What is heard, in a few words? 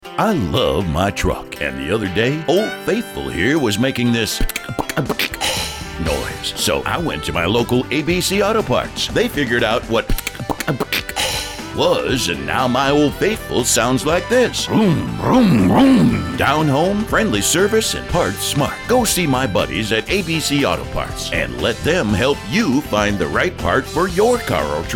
Country; ABC AutoParts